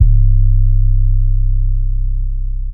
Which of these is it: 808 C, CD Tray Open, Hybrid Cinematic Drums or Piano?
808 C